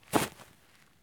stop grass low; Escape from Tarkov

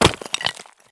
landing tile; Escape from Tarkov

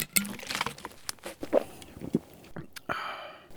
use; Escape from Tarkov

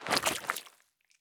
stop puddle; Escape from Tarkov

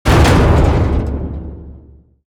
damage hits explosions, Warships

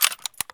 use; Escape from Tarkov

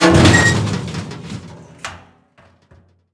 metal barrel; World of Tanks Blitz

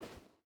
footstep rubber boots sand walk; Rust

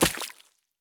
sprint puddle; Escape from Tarkov

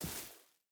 footstep rubber boots grass walk; Rust

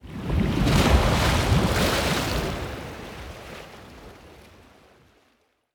ambient battle; World Of Warships

